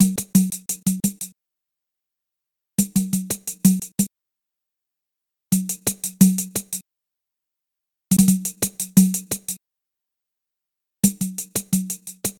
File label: Loop Pandeiro